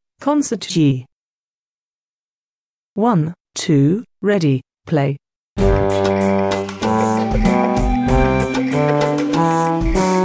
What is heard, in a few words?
concert g scale